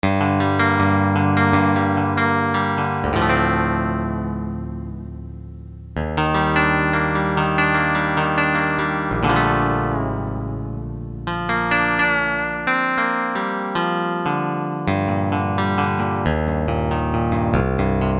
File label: E. GUITAR, Korg DSS-1; Rhythm Section & Guitars